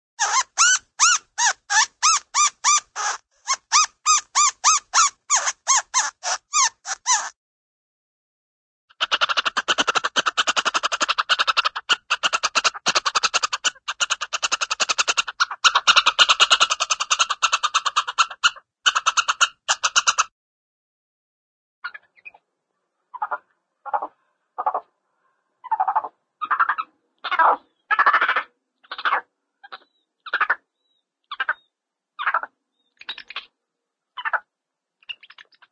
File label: monkey small monkey squeaks, animal, ape; cartoon